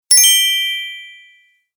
Electro, notifications; BlindShell; BlindShell-Classic-2

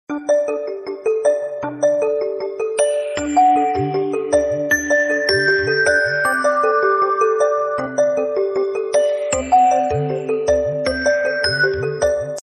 Dewdrop step, Samsung

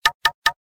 Knock; Optimus L3 E400

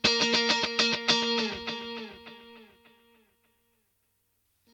Rhythm Guitar, Ringtones; Samsung Z1

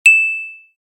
Ting, notifications; LG Revolution VS910

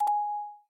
panorama warning; Samsung Galaxy S10; SamsungCamera

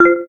camera click; SK telesys SK-S150

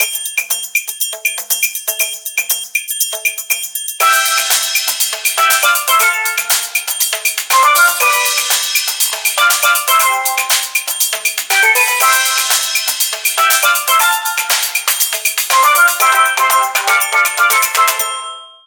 music havana market, ringtones; Samsung GT-i7680; cmcc

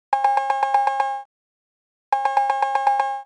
80s Arcade score; Nokia; Enigmatic

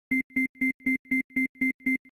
selftimer 2sec, camera; Sony Xperia sola

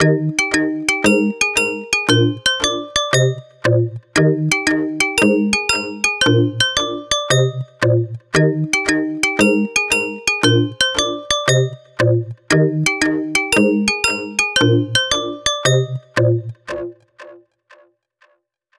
Toy Robot, ringtones; Xiaomi MIUI-14

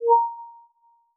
Wireless Charging Started

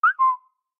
Bird Cicada Notification Day; Xiaomi Redmi-Note-8-Pro

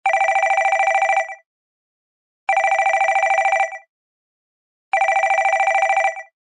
Telephone Ring; GD550 Pure

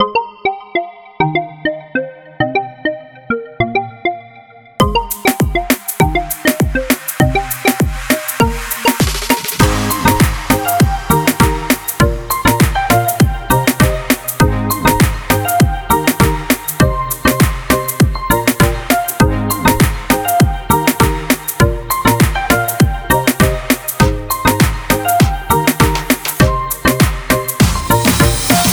Joyful Lollipop, ringtones; LG Wing 5G Verizon

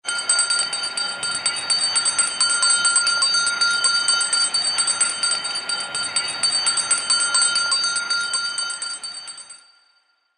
Bells Ringtone; HTC; Charity

